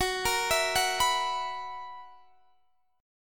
Gb 11th, Guitar, Drop D; chordshape; down

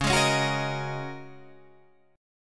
G sharp 9th Suspended 4th C sharp

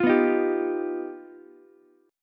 G Augmented 7th, Ukulele; G+7 strummed; JGuitar

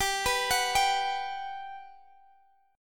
G Major 7th, Guitar, Open G; x,x,17,16,19,17; down

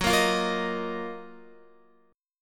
Gb Major 13th, down, Guitar; strummed; JGuitar

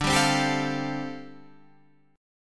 G 7th Suspended 2nd Suspended 4th D

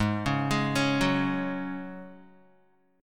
Ab 7th Suspended 4th Ab down Guitar; Ab7sus4 arpeggiated

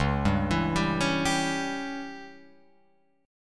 Db Diminished Db down Guitar Open F; arpeggiated; JGuitar

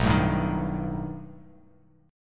B Augmented 7th B down Bass Standard; strummed; JGuitar

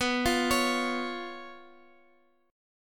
B Suspended 2nd Flat 5th down Guitar; arpeggiated; JGuitar

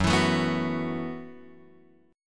F sharp Minor 11th, Guitar, Open F; strummed; JGuitar

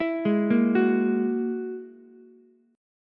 Ab 7th Suspended 2nd Sharp 5th, Ukulele; Ab7sus2#5 arpeggiated; 9,8,6,9

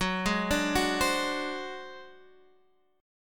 G sharp 7th Flat 5th F sharp

